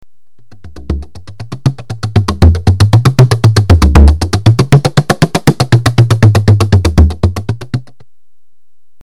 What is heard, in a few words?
boom brush